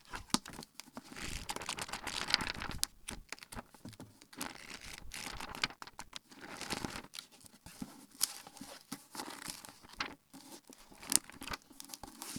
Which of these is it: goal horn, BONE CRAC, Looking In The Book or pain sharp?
Looking In The Book